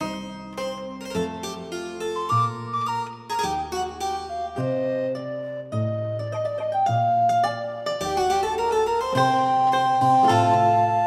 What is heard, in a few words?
Explore Music Loop; fat princess adventures; ps4